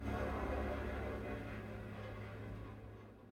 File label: GUI zoom discover; the 39 steps